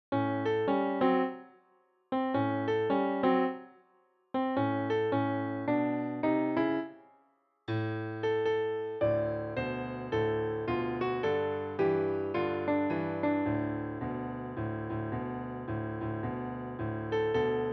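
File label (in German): Klavier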